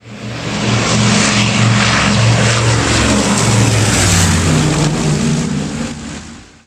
dc4, aircraft; Simutrans; Pak128.Britain-Ex

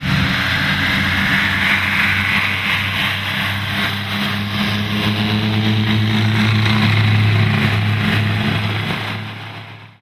vickers vimy; Simutrans; Pak128.Britain-Ex

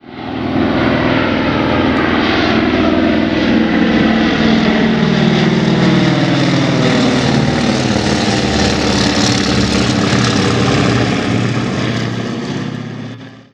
junkers ju 52; simutrans; Pak128.Britain-Ex

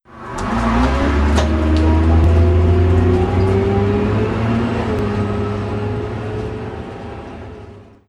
dennis dart modern; the-bloke33; simutrans; Pak128.Britain-Ex

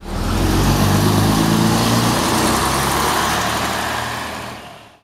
bristol coach; simutrans; Pak128.Britain-Ex

